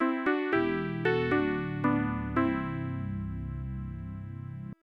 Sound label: Title Jingle; burning dragon pc 9801 opna